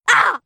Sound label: hit; Gumball - Go Long!